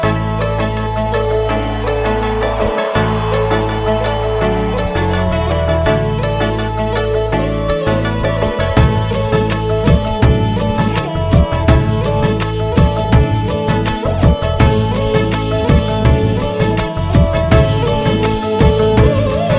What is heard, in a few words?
bollywood remix music ringtone